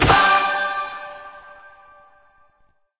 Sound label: Achievement Collect